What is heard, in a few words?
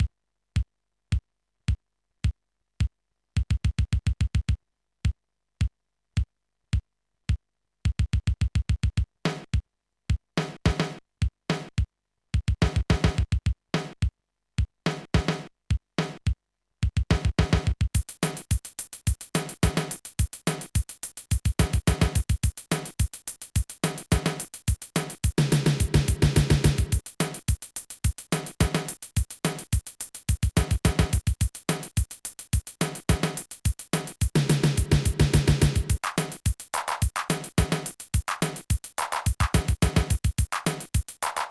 Beat Box; BeatStomper II v1.0; BSII-Songs